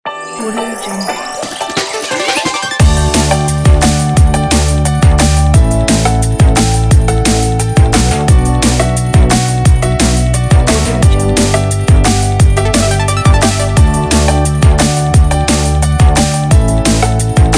optimistic energy

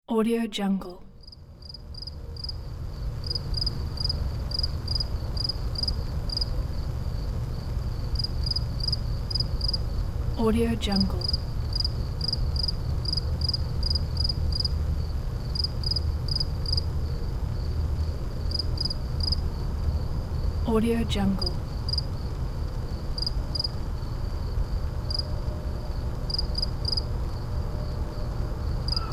city street night

